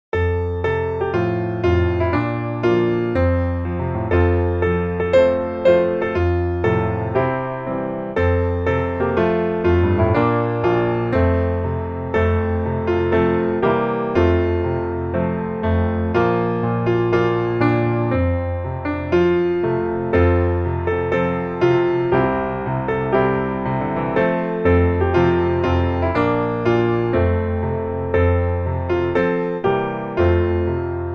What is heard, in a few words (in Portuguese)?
Ha uma ovelha que se afastou piano